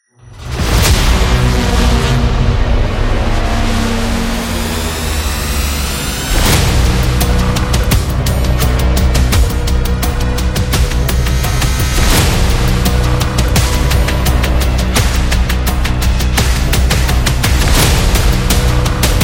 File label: Somber